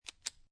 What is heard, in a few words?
Suppressed Pistol Amphibian Load; War; VRSonic